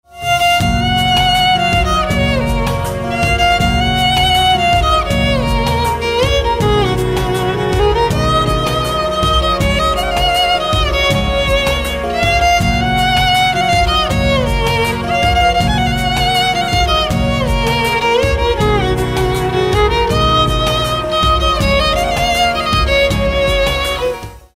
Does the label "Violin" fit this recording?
Yes